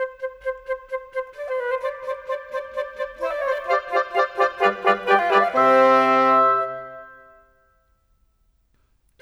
Cinematic, Orchestral Series; Sonic Foundry (Sony Creative Software); Orchestral Series VOL-3; Cinematic 21

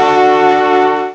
FANFARE; EFFECTS; CMI Sound Library Rev 1.3

